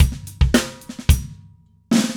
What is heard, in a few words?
Acoustic Drumloop